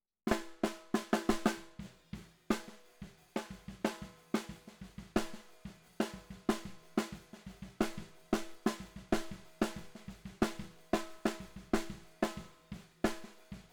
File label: SNR Bot